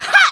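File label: MON ATTACK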